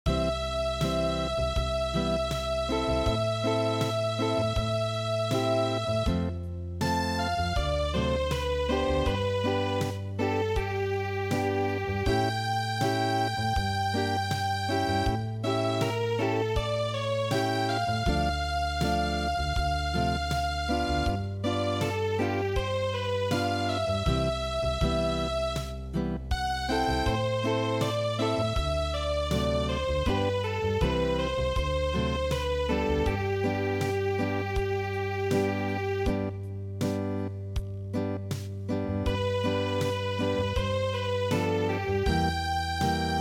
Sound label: Unplugged